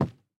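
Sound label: muffled quiet knock on the door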